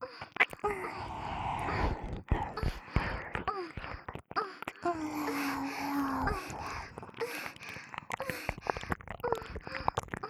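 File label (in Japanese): 作業喘ぎ＋片耳舐め; 美冬; 小春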